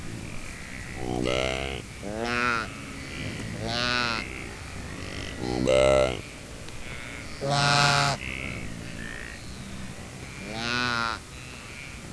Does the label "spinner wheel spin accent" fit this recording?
No